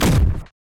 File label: kick light